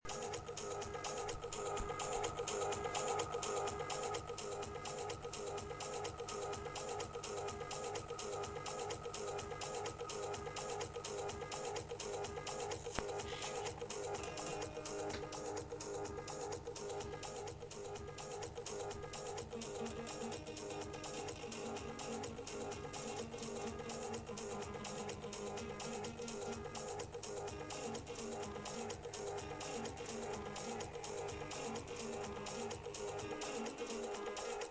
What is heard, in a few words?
stoned electro steppa